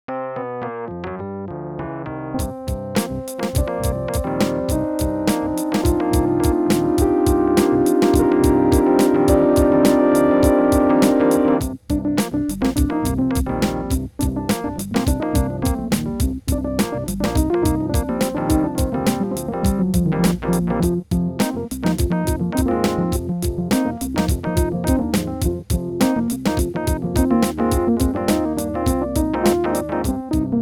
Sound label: MIDI blues